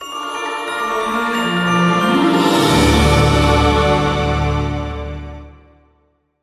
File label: success; Epic Mickey; Wii